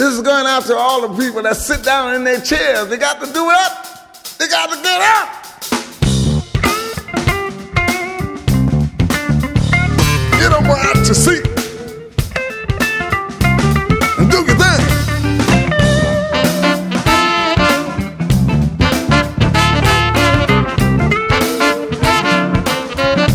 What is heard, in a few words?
funky